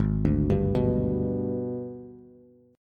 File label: Ab Minor Major 7th Flat 5th chord, Bass; arpeggiated; 4,5,5,4; JGuitar